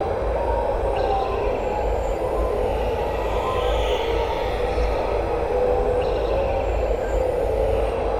crevis wind

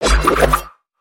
Samurai Spin; Valiant; aronwk collection; ndaudio

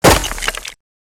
Crates n Boxes, destructibles

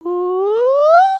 big love, emotes

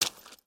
Foot Swamp Water Run, footsteps

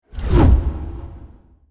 torch by oneshot

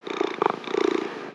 Pet Cat Purr; creatures pets; Lego

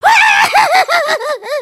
freak out, emotes

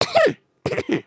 headspin, emotes

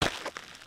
Foot Dirt Sneaker Walk, footsteps

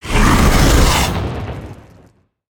Fire Breathing; creatures organic

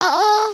need to pee; emotes

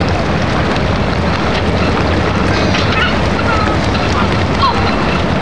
ocean light loop

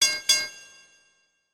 train bell; destructibles

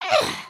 sneeze

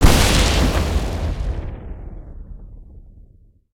Maelstrom Snowman, destructibles

